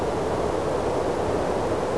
wind little

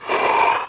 freezer attack

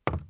cruiser move

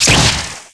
madness crusher

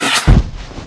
ICEHORN die

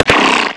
ICEHORN stand

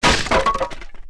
skel archer die

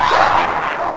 retribution attack

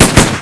FALLEN HIT; night watch; midnight

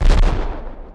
basic grenade; night watch

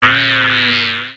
infrared scan

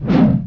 attack

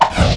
attack; YORMI B

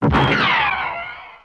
soldier die

